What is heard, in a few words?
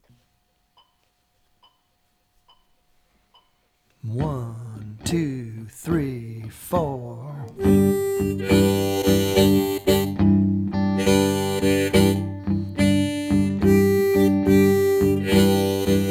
Bird c,s,c 70bpm